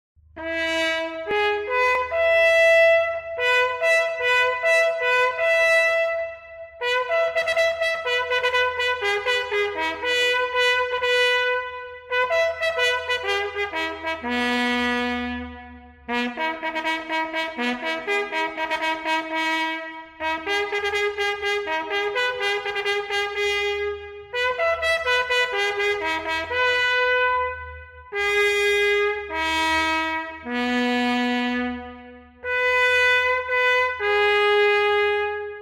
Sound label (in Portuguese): Alvorada, toques